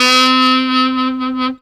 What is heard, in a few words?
tone vibe; Zero-G; Funky Elements